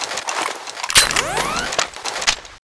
chainsaw reload, weapons

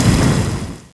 cannon dragon fire end, weapons

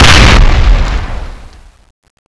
magic knife magic exp, weapons

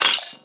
mine beep, weapons

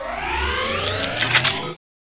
charge, weapons

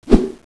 katana swish

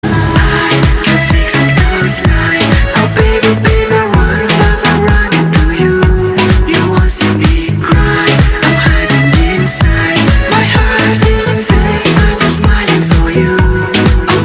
theme start

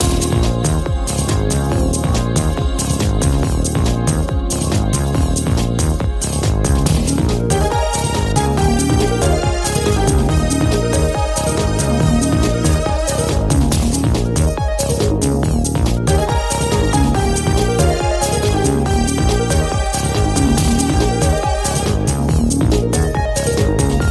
parkour music; lancelotgames

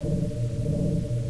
sword stack idle, weapons

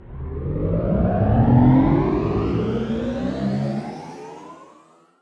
spear idle, weapons

